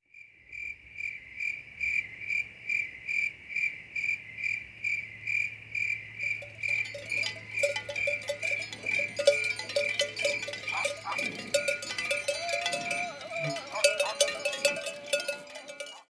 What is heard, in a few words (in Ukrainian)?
polonyna v karpatah